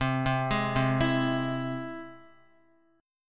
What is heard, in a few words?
C Augmented, Guitar, chord; arpeggiated; JGuitar